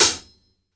hit, hi-hats; Sampleheads; New York City Drumworks VOL-1

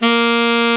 sax alto mf A, Short

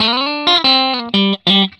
RIFF 120, Vintage Blues Guitar

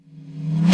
REV VerbTomH, Roland JV-2080

